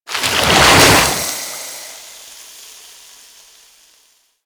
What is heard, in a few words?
Infested Moa Slow Bomb Dissolve; Warframe; Attacks